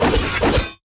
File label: Attack Heavy; bloom minigame